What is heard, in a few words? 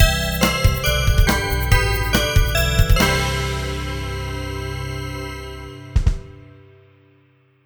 chime